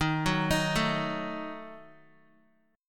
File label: Eb Diminished, Guitar; Ebdim arpeggiated; x,6,6,8,9,x; JGuitar